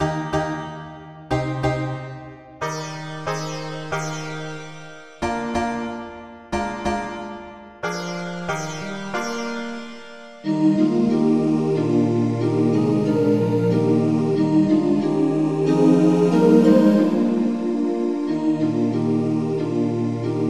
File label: polyinstru; hymns; Himnario grande